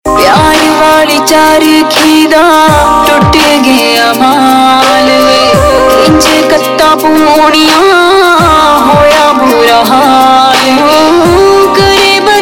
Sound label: Punjabi Ringtone